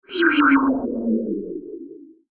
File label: Space Slow Down, science fiction sound effect